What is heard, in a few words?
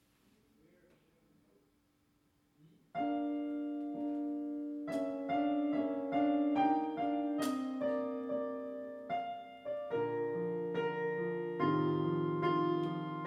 Strings Piano Concerto in D minor; Mozart; K466 2nd movement